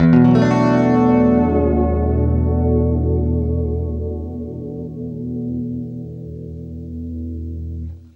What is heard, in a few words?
FLESH CHORDS, Guitar; East West; Steve Stevens Guitar Samples Collection